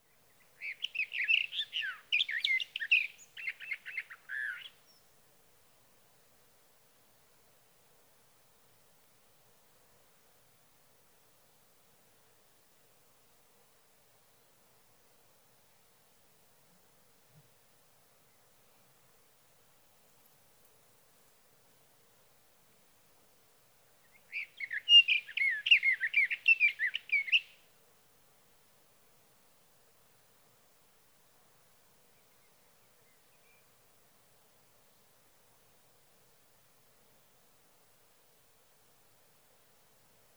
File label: Sage Thrasher